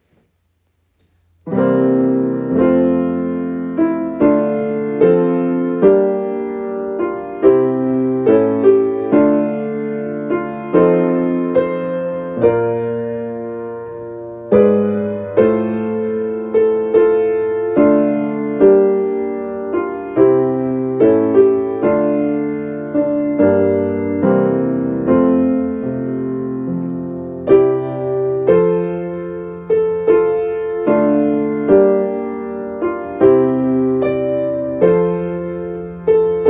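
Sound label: Fancy Auld Lang Syne, Piano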